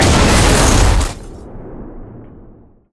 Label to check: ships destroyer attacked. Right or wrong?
Right